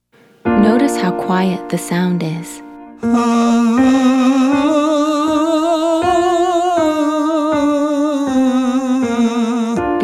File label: Exercise mezza voce male; Singing